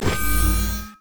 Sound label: sniper light; LoL; lux